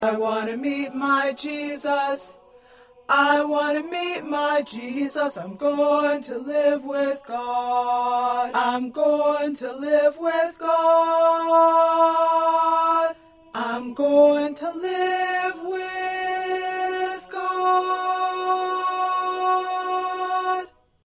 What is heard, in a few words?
1st Tenor